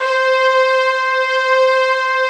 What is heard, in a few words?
ORCH+BRASS C5; Greytsounds; Scott Peers Sound Engineering